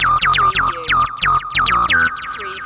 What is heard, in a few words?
Hip Hop Synth Licks 90 BPM - E - Swing